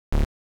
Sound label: boop; longfox; fenix; firefox